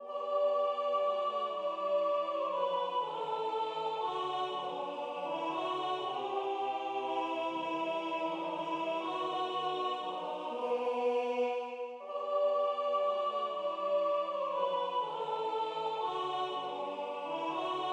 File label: Greensleves Alt; Greensleeves Teil 2 (Takt 75 bis Takt 102)